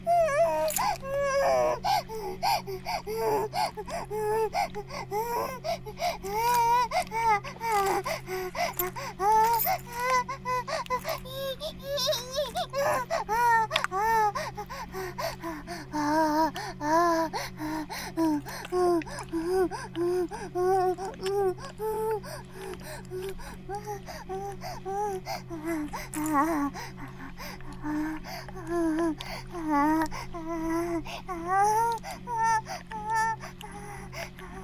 バイブ放置, ASMR

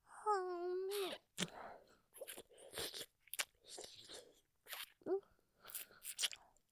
舐め, 吸い付くようにねっとりと, ASMR; ループで楽しむ！シェフの気まぐれ抜き抜きコース

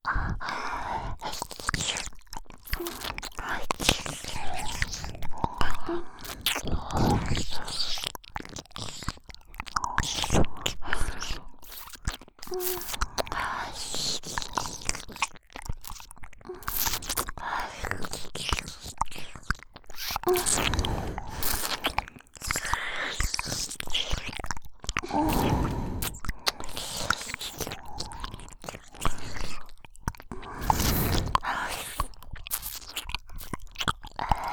両耳舐め, ASMR

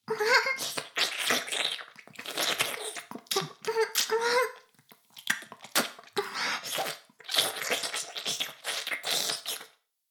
耳舐め音声; 古き城のドラゴニート2

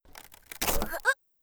もげる

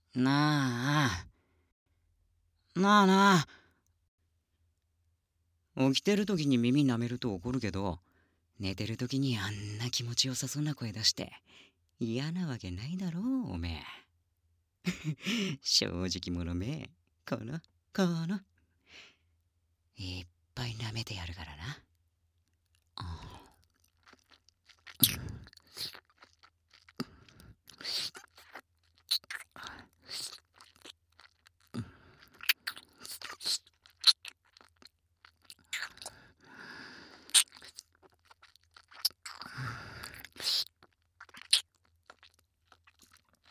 ごはん催促のぺろぺろ 耳舐め

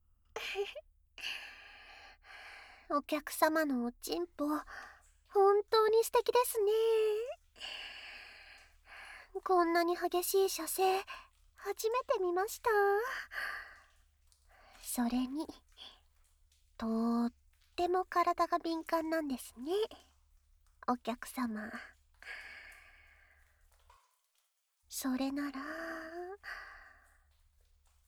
オイル耳舐め（バイノーラルマイク 効果音あり）

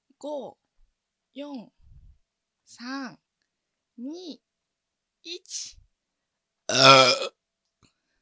カウントダウンゲップ

